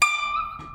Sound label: chanyin; GZ IsoTech